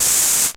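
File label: Hi-Hat Open, Luxor Passet; Virtual MPC Electronics; Drums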